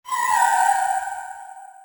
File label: scare; whiteface